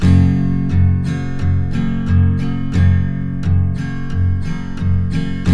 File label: Guitars; Spectrasonics; Hans Zimmer Guitars VOL-1